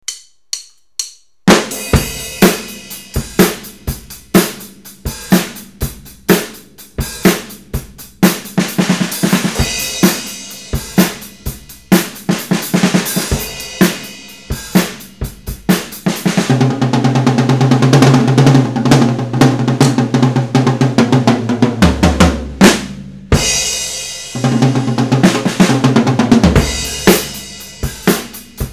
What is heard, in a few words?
Drum Test